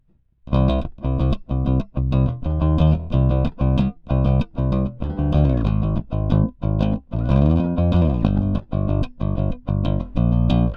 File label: G&L L1505 pick